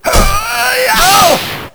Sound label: throw; Yo-vs-Yo